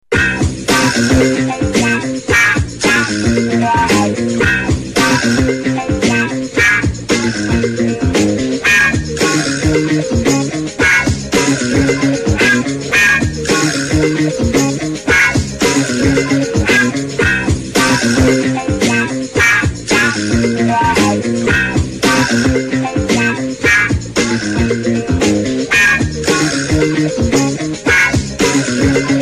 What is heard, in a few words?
Tiebreaker, Game Show Sounds; Match Game; Think Music used for tiebreaker questions.